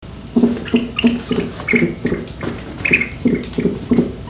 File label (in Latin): bubo virginianus